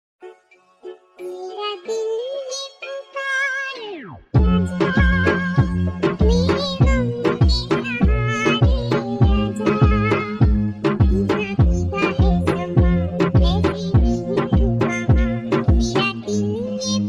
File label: remix